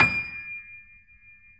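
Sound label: grand piano D 6; USB Soundscan; AKAI; Keyboards Acoustic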